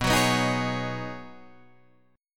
B 6th Add 9th B Guitar Open D, chord

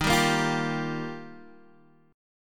F 7th Suspended 2nd Suspended 4th Eb

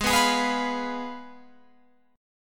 Ab Minor 6th, Ab, Guitar, Open F; Abm6; strummed; 20,18,20,18,20,18

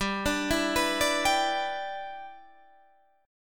G 6th

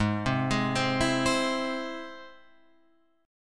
Ab Augmented Ab Guitar Open F; Ab+ arpeggiated; JGuitar

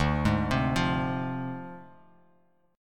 D Minor 7th Flat 5th, Dm7b5 chord, Bass Standard; arpeggiated

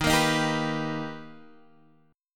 B Augmented Major 7th Eb, chord

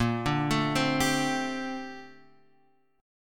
A sharp Major 13th A sharp; A#M13; arpeggiated; Standard; JGuitar

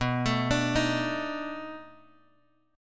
B 7th Sharp 9th, down, Bass Standard; arpeggiated

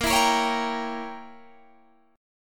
A sharp Diminished 7th A sharp

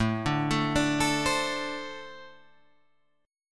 A-Minor Double Flat 5th A Guitar Open G

